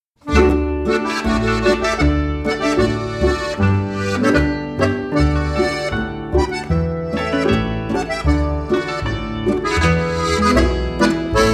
Boarischer

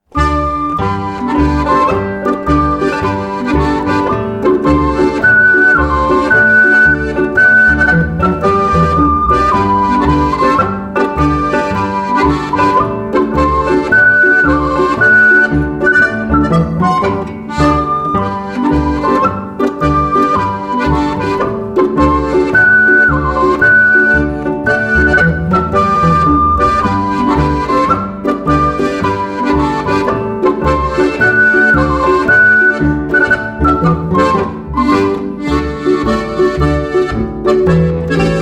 Okarina Polka

